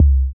Kick, Kickdrum; Equipped Music - Loungin House

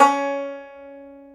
Tenor Banjo C#4; Multisamples; Digital Sound Factory Planet Earth

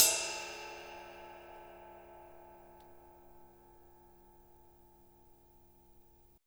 RIDE, Drums Acoustic

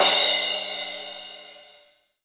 CRASH, Crash Cymbal; MONO; AKAI S6000 CD-ROM - Volume 3

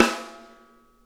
rim, drum kits

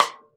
RIM, Drums Acoustic; AKAI; 90s sample CD; USB Soundscan vol.10; RAW KIT 7

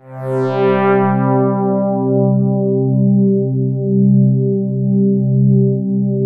5th hard C2; SPACIOUS; Music Store - Claudius Diemeter Schwerlos; SPACIOUS 1

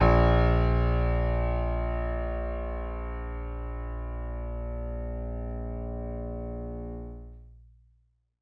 MIX R, Acoustic Piano, PIANO STEREO; InVision Interactive; Miroslav Vitous

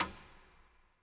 MF87 L, Acoustic Piano; InVision Interactive; Miroslav Vitous

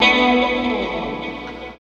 GTR; East West; Phat & Phunky

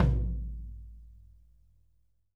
tom, drum

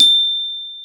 A5 GLOCKENSPIEL; InVision Interactive; Lightware VOL-1 - Instruments & Percussions

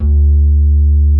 D1, SYNTH PLUCK; Music Store - Claudius Diemeter Schwerlos

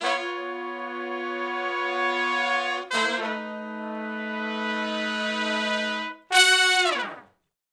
Slo Blues Chords (V, IV, I) (F#) w falloff; Sections; Crimson Blue and Fabulous Horncraft 4 RnB; Horncraft for R&B

